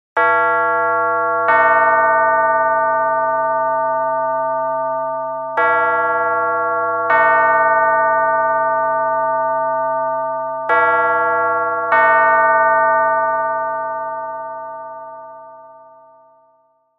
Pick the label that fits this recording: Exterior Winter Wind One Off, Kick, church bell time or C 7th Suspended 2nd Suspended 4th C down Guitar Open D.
church bell time